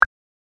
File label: generate